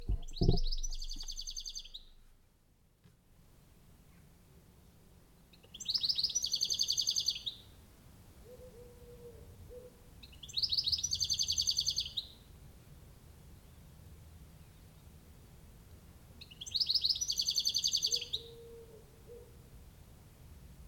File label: goldfinch